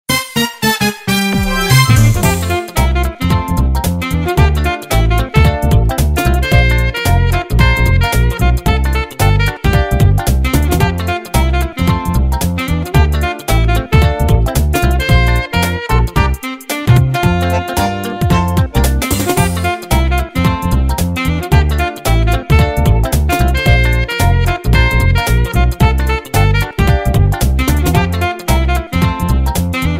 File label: Super Bell